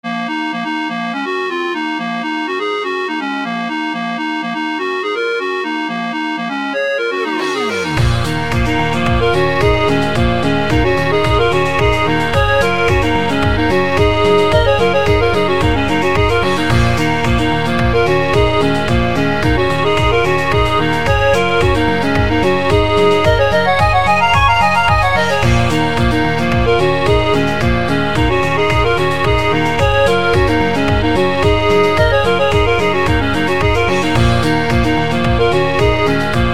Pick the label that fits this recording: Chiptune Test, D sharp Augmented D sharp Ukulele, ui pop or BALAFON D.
Chiptune Test